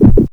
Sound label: hit